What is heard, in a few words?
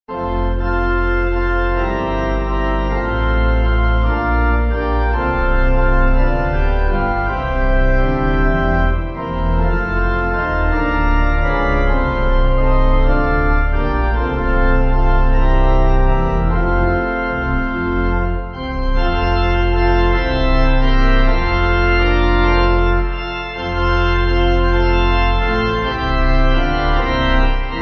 Take Up Thy Cross, Falls Creek, pipe